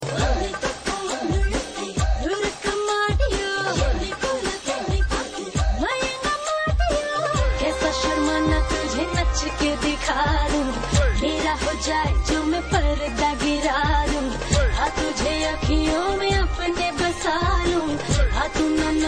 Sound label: Female